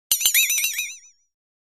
Bouncing Twang ( Metal ); Bouncing Multi-pitched Metallic Twangs.